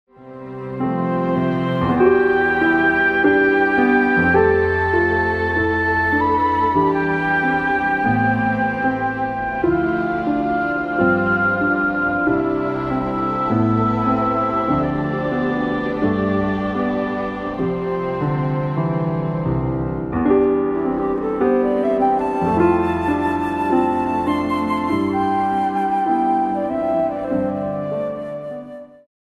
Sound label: lullaby brahms lullaby intro twinkle twinkle